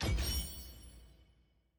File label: level select click; tft